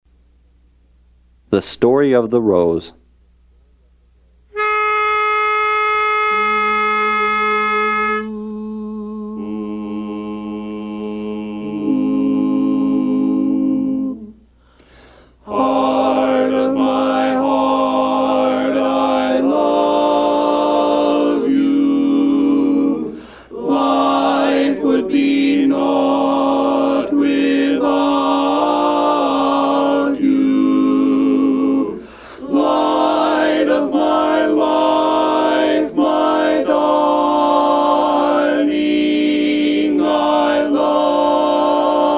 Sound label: Tenor